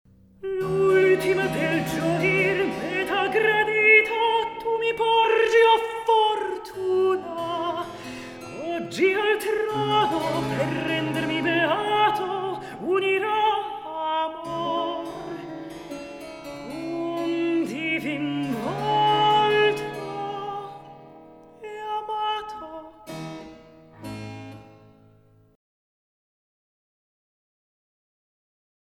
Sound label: Recitativo; L ultima Del Gioir Meta Gradita; klassicheskoe